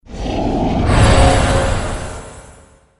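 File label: attack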